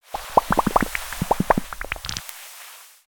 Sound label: Bubble Blower Item